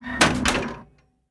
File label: door ladder hatch close start; Rust